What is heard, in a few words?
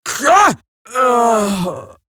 death, female; en; Eximus Grineer; Battle Barks